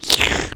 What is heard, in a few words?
shit packing; poop; ShittingSimulator